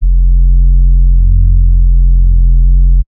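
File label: bass sustain sub F; Polyend; ARC Noise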